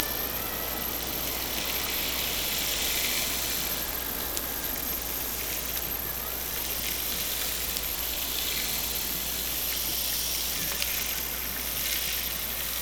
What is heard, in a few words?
Hydrophones, orcasound